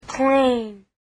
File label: plain